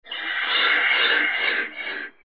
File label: phoenix bird laugh; dota